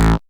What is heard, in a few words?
Bass A, Offbeat Bass; VEC1; Club